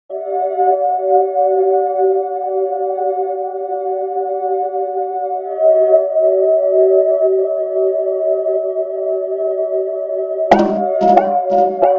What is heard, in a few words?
music match intro 160bpm; omori sound replacements